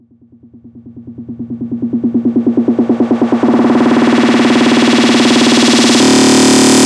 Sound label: 140BPM 4Bars, Hands Up Trance Fills; Estatic; Vengeance Samples